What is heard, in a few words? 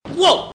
WOAH